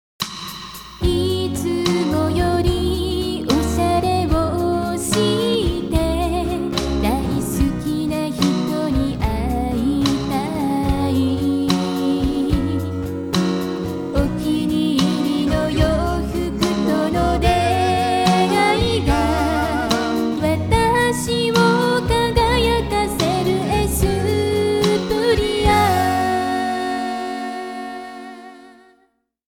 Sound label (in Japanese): CMソング; エスプリア様